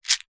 candy sweets mint lozenges in plastic tub short sharp movement; food